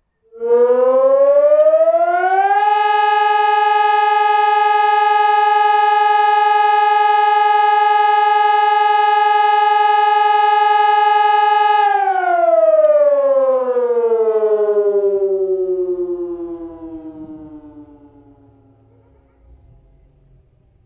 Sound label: air raid, sirenes; ses 1000